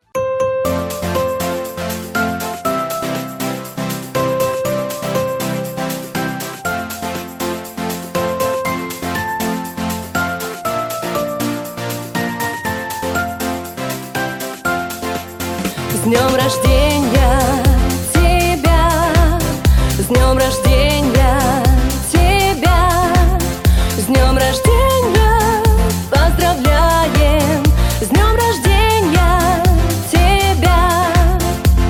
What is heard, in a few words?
Happy Birthday Russian Version; Happy Birthday song in Russian Version